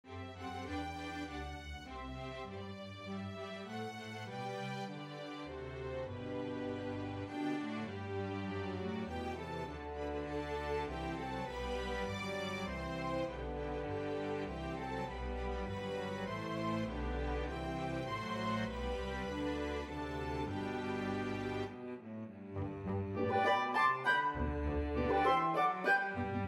Cello Track with Melody; The First Nowell

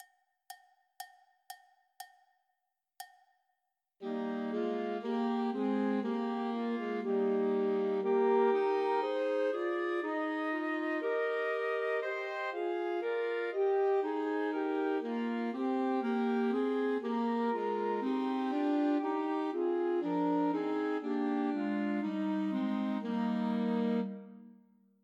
Flute Clarient and Alto Saxophone; Unto Us a Boy is Born

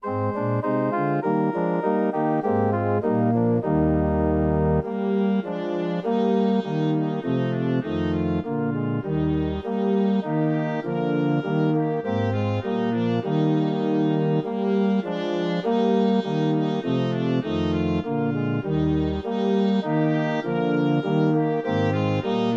Trumpet Track with Melody

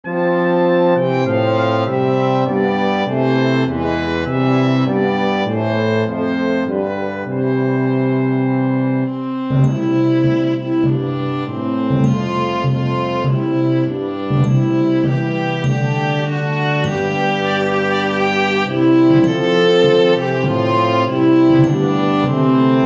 viola Track with Melody; While Shepherds Watched

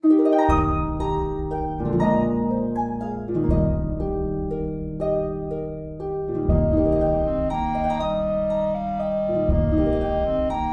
Clarinet Track with Melody; Silent Night

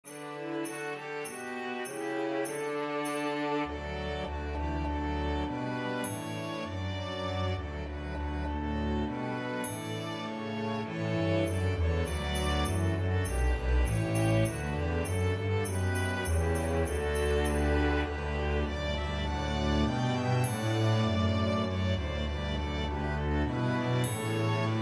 Double Bass Track with Melody; The Holly and the Ivy

